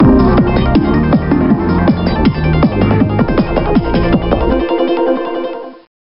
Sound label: Country Win; powerbike 2009 nds gamerip